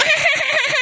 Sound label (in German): Ratte Smash; mobil; Jamba